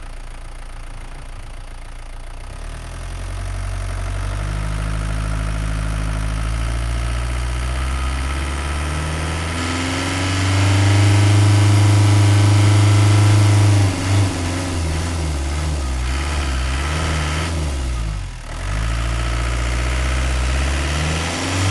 renault master 28dti raw engine accelerations wind; mono; Sonniss GDC